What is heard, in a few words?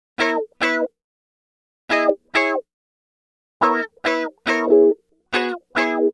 070 Pop Guitar Wah C# Min, Electric; Pop Rock; Electric 055-099 bpm; Pro Tools Essential Loops; Digidesign